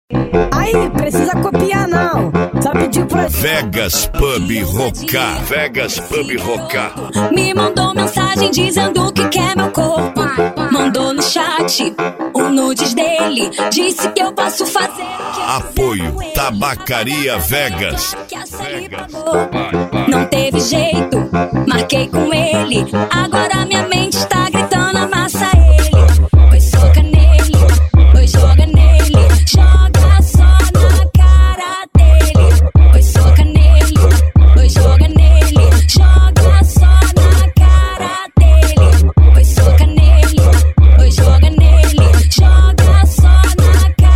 funk mega bass

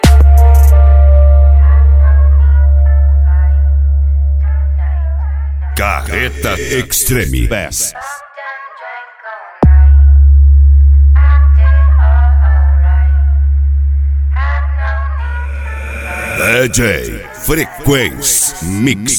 extreme bass; carreta

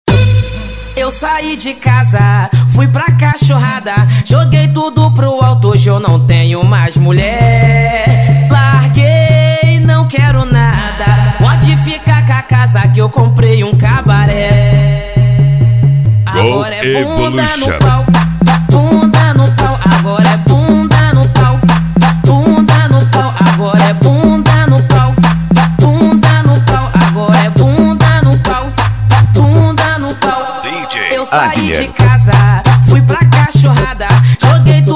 funk tumdumdum funk bass

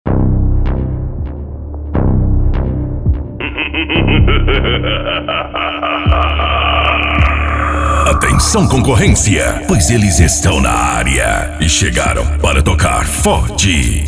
funk mega bass; abertura

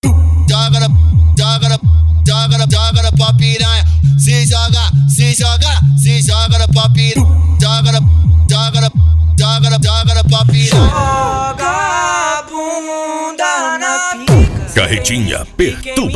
funk bass; carretinha pertubasom

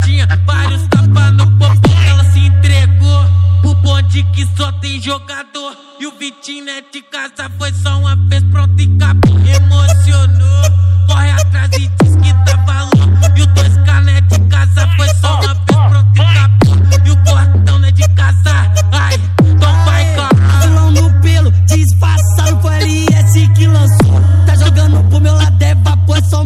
funk bass

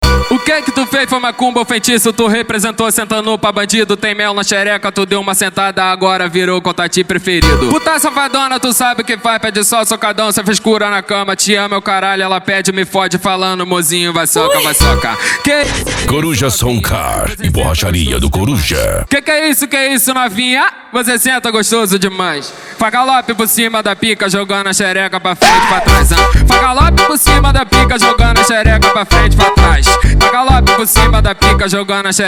funk; coruja som car e borracharia do coruja

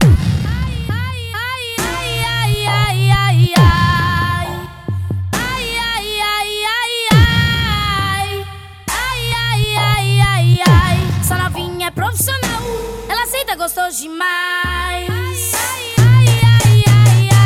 funk bass

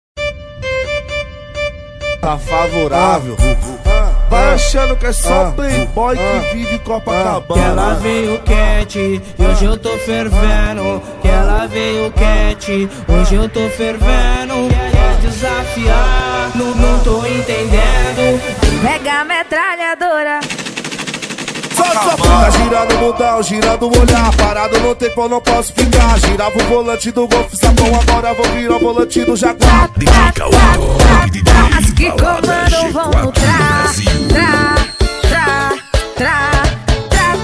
remix; balada eletro funk

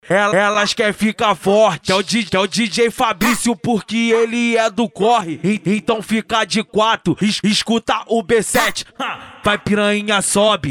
funk

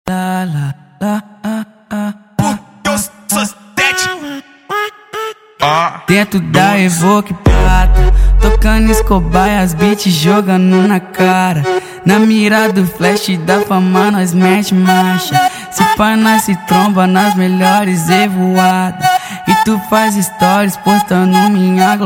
especial funk; cd bad boy som automotivo usa

